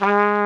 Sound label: FLUGH, Orc Brass; Club-50 - Foundations Roland